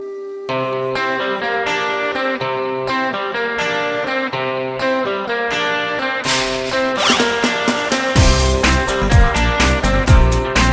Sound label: cool guitar tune; alternative